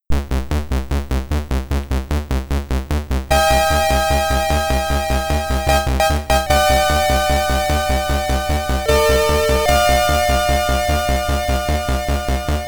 Warp, commodore 64

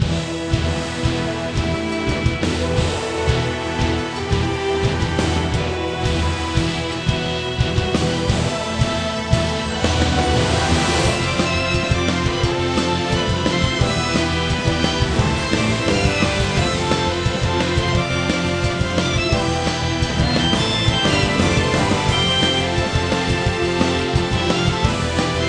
Battle Loop; Guild Battle

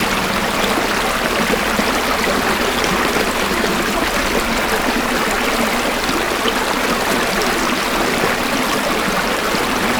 Ambience Exterior River

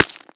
grab ice; Scrat

